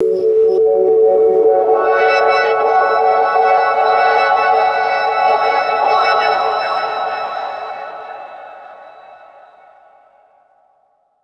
cascading resonant electronic sci fi tones; beeps

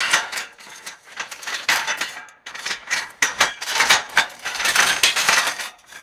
crunchy metal scrap rustling and scraping

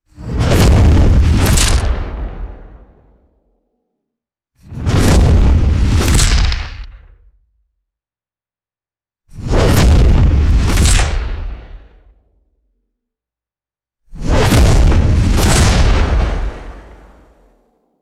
heavy booming cinematic cannon impact; guns

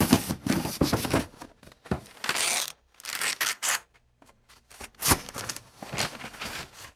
cardboard handling and paper ripping

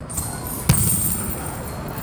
metallic chain basketball net swish

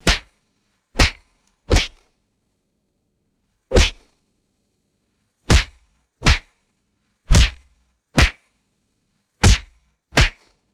sharp fleshy skin smacks and slaps

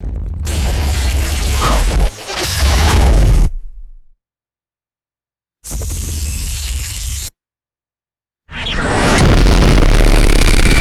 sci fi static sizzle and glitchy impact; designed

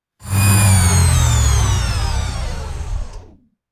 futuristic engine wind down and power off; motors

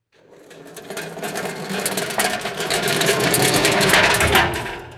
metal food cart rolling and stopping; vehicles

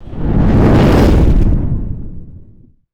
large deep fireball whoosh

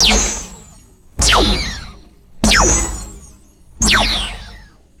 short retro sci fi laser blasts; lasers

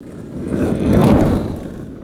soft fire torch whoosh

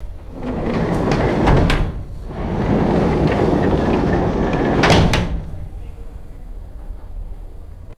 vintage tram sliding doors closing; trains

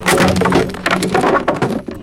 wooden debris dropping and bouncing

